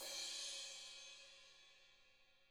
ZILDP CRASH; ILIO; Double Platinum Drums 1

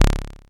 dry kicks; Quasimidi Rave-o-lution 309

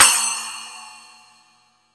SPLASH, Drum; East Collexion; Drum 1 Dry

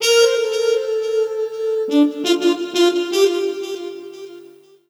sax, Roots Funk; USB Soundscan vol.41; AKAI

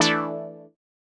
125 stab E, Keys, Nu Jazz House; PowerFX - Nu Jazz House

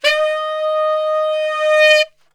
D#3 SAX; Best Service; Brass Super Section

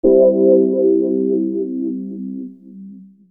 Digi Pads; Jungle Frenzy 1

